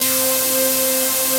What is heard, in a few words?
TECHNA STABS, TECHNO; Pro-Rec - Synthsonic; Z1 TECHNO